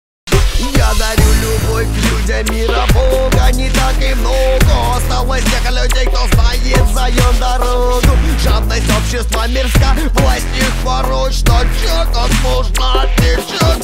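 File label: orchestra dubstep version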